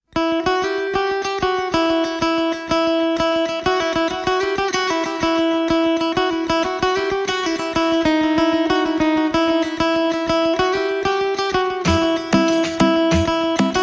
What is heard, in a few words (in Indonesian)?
Instrumen Aceh Tarek Pukat